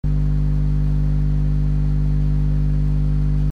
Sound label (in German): mikro nahe microwelle